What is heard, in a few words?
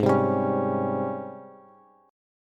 G sharp Major 11th, Bass; strummed; chord